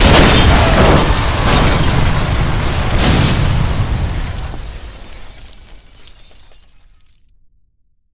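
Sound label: prolonged catastrophic crash with many cars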